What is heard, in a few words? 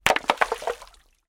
impact stone hit water rockpool beach splash